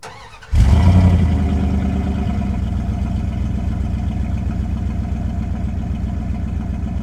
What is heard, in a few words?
Truck Dakota Start Idle Loop; Trucks